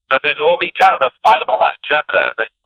cadence call in the usa, chant

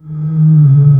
low hollow ghostly moan

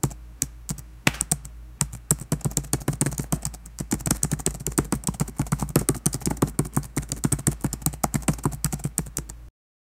typing sound effects; everyday sounds

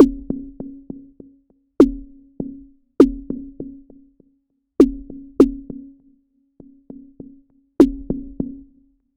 a slow tempo syncopated, rhythm

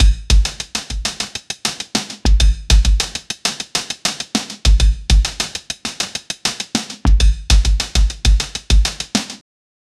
drum sticks

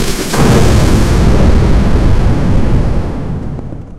cute but fierce storm

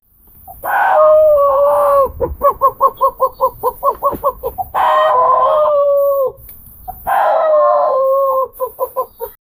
a broody chicken shouting; brooding

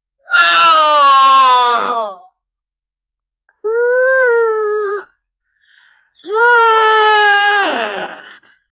human shocked, reaction

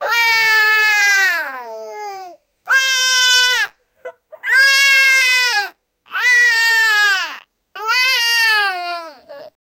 young boy crying

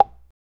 go stone placed on wood

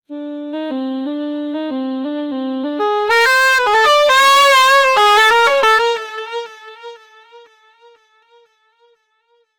sounds club sax dj

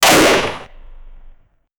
meaty compressed shotgun, retro games

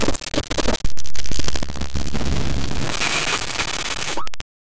digital glitch

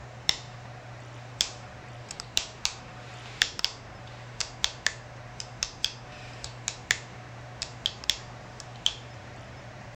the sound of a robotic, robotics

